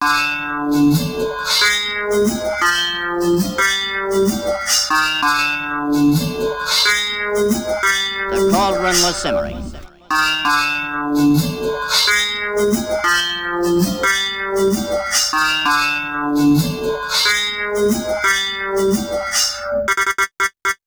Melody, Hip-Hop; Sonic Foundry (Sony Creative Software); Lukecage Underground Soundlab Hip-Hop

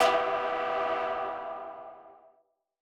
M Dynamic Tech E3; KG MegaSaur

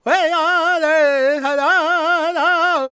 C# MAJ, LAKOTA, Vocal; ILIO; Vocal Planet VOL-5

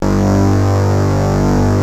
SYNCLAV SUS, SYNCLAV HITS; Greytsounds VOL-1

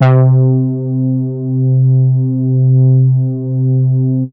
C3, BASS; Q Up Arts; Dream Experience; BASS BANK 13

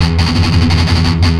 Riff, Industrial; Nasty

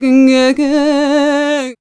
MEH1 VOX, Balkanish Traditions

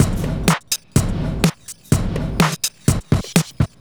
Drum Loops, 125 BPM

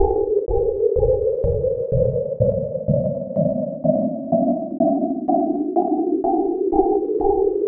synth 125 shepherdtones; Transmission-X

